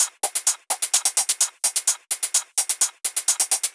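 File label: Drum Loop 128BPM hi-hat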